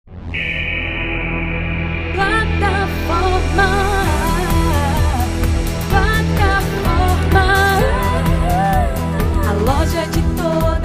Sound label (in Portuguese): jingle loja; loja plataforma